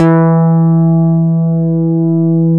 BS MIDI, Synth Bass, Analog Bass; Roland L-CD701; Synth Bass 2

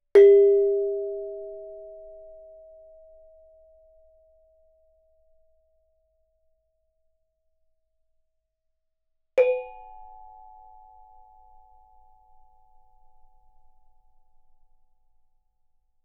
Gamelan; Propeller Island - Complete Gamelan

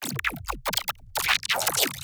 Funny Neuro

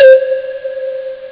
bird; Amiga Soundtracker sample

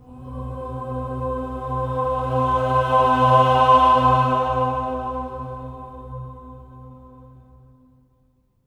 OCT G3, swell octave, voices

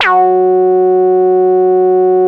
BL F#4, Korg; Back In Time Records; Korg Universe VOL-1; 800DV

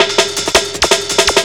Speed Loop Snare Fill, Drums Beats

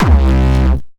Jump kick, Jump hardstyle kick

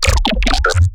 glitch stab; Virtual Riot; Glitch Stabs

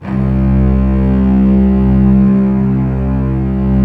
STR SEC, STRINGS; Roland - LA Composer vol. 1

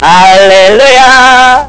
haleluja; Amiga Soundtracker Sample Packs

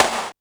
SD, Dance Mega Drums; Best Service; SD-HIP

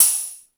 Beat Box; E-MU Pro-Cussion

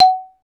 XYLO; Roland - LA Composer vol. 1

